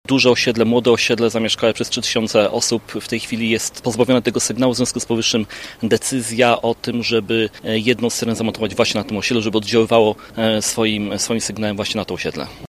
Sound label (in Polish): syrena